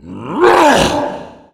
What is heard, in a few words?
tank attack, voice